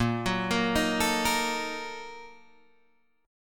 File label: A sharp Major 7th Suspended 4th Sharp 5th, Guitar Open G